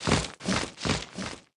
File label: Wing Flap Tiny